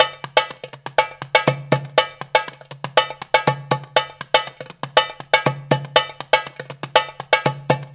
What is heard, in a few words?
Dara Dance, Darbuka